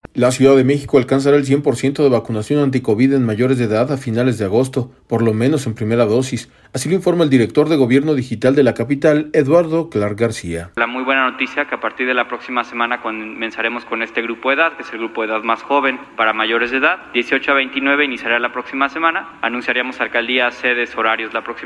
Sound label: aaah